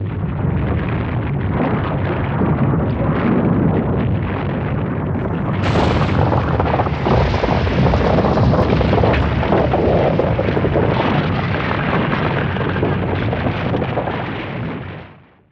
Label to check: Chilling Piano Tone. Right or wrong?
Wrong